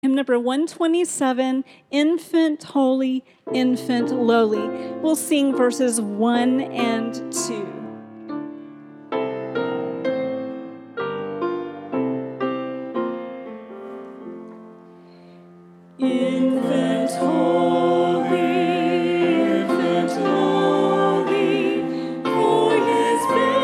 Infant Holy Infant Lowly, SDA Hymns, Sabbath School Choir; ALL 2 Stanzas